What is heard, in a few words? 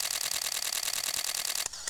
Shutter continue